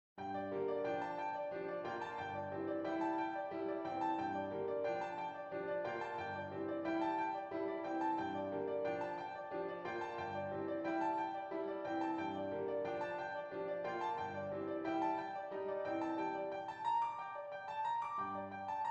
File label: carol of the bells satb soprano 1 predominant